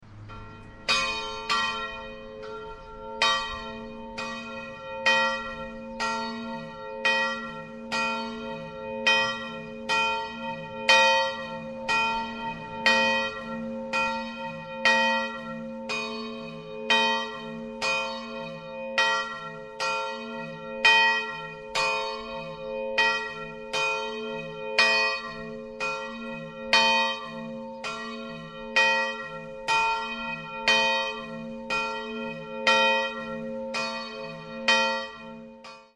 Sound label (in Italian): casasola di maiano, campane